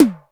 ELECTRIC TOM H; Greytsounds VOL-1

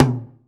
SI GUIRCA, ETHNIC PER; AKAI S-Series CD-ROM Sound Library VOL-5

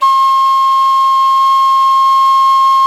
C 6, reed flute; SoundBytes - Early Patches

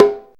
Morocco, ethnic drums; Zero-G Ambient 1

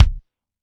Dry Kick, Drum Machines Roland R-5

